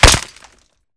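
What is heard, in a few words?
Bullet Light Ballistic Concrete impact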